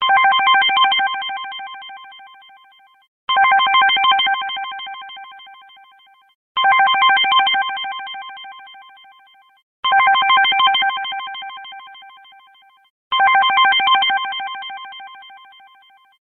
Best Ringtone for Android

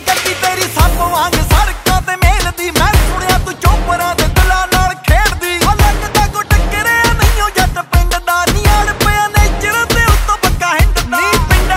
Desi Punjabi Ringtone

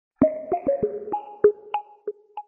SMS Ringtone; Huawei MatePad Pro 11

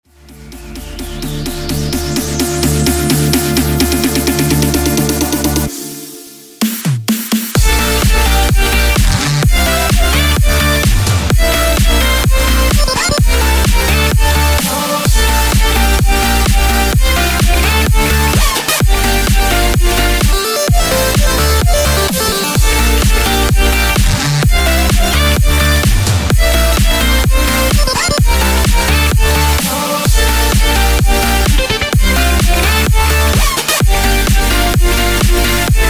Instrumental

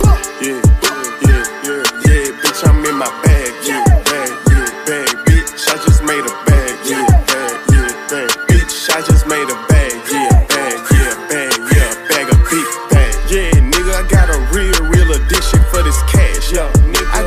English Rap

